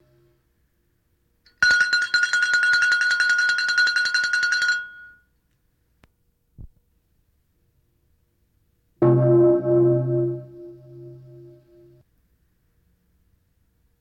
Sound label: bells; used to commemorate those who've suffered abuse; The Clothesline Project